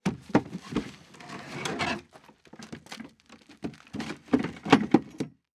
Foley Wagon Load With Wood Box

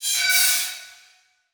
Beep Evolver; Multimedia Internet CD-Rom Flash

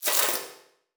Multimedia Internet CD-Rom Flash Wipe

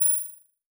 Multimedia Internet CD-Rom Flash Beep Multiple

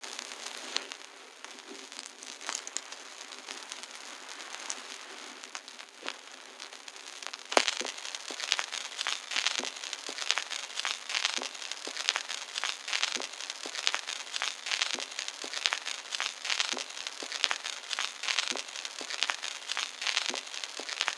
needle end, Vinyl; Cineblur

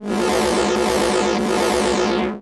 Sci-Fi Electronic Energy Distortion; Science Fiction

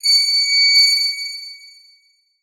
Title Transition Crystal Glow

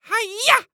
Human Male Karate Yell Nerdy Hi-Yah

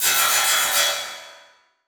Transition Hit Impact Electronic; Production Element

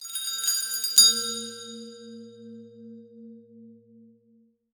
Transition Bell Chime; Production Element Title Transition

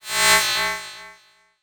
Title Transition Laser; Production Element

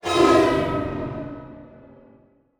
Transition Whoosh Fly By; Production Element

